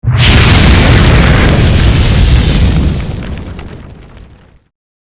dragon breath fire flying

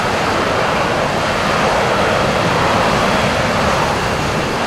engine

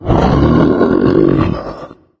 mancubus die; doom3

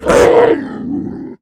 attack

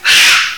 alert, zombies

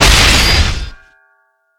impact heavy

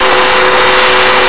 turbine; acf engines

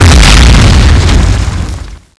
cannon exp; tfa cso; holy sword

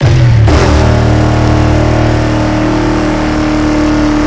shelby second

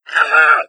pain, hazmat zombie; doom3

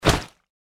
feral ghoul swing; npc

